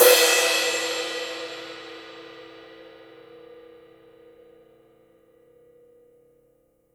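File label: cymbal, Real Drum Kit, swing kit; Best Service ProSamples vol.43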